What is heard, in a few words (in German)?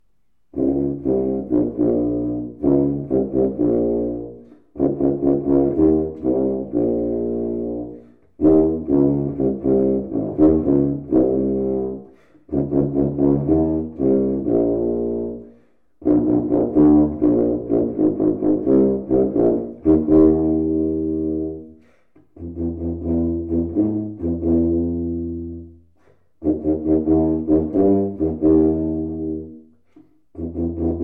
Tuba; Wickie